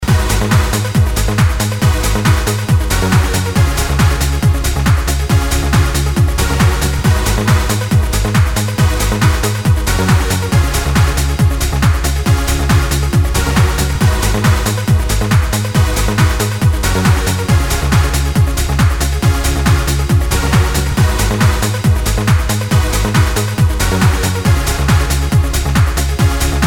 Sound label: Dance Beat Loop